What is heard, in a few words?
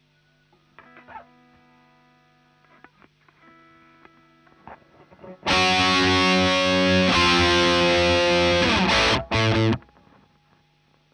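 guitar; Marshal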